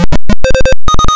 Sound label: get card sound; Keen1